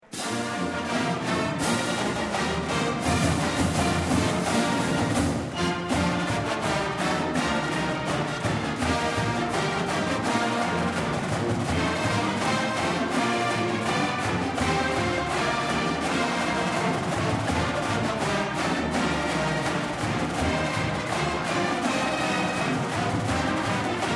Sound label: Marching Band; BYU Cougar Marching Band